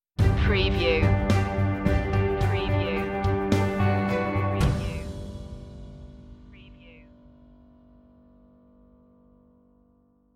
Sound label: reach for your goal stinger